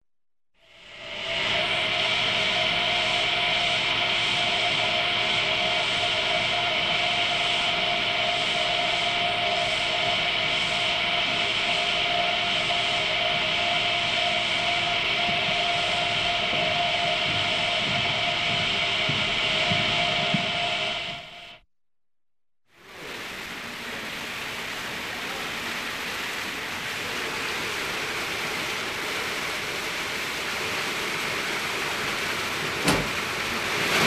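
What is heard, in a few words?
sawmill atmosphere sawing logs into boards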